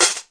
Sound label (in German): found sound kettenschüssel; UNUSUAL KITS and FX; Werkstatt Found Sound Kit